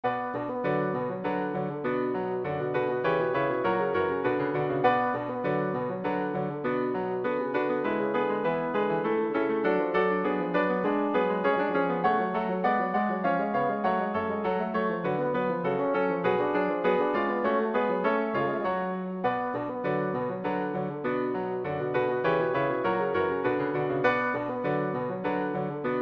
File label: bach badinerie, bassoon